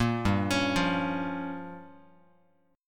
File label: G Minor 7th Flat 5th, Guitar; Gm7b5 arpeggiated; JGuitar